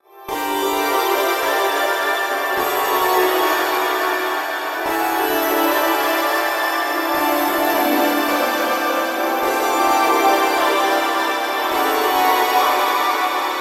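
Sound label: big chill arp sequence D minor; instruments; loops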